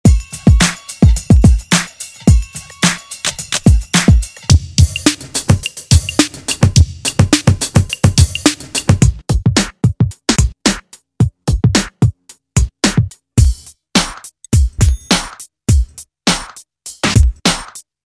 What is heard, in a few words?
Hip hop loops